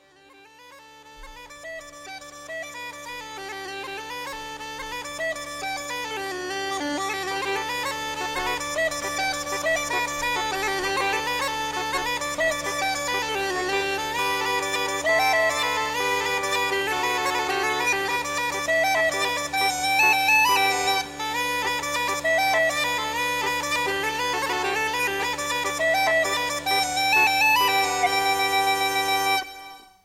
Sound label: Uilleann Irish Bagpipes; Weddings